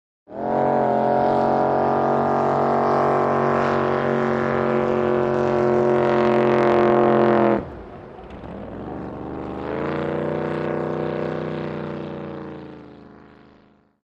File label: Drag Boat By Slow